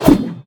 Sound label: strong, player attack; Minecraft; Votive World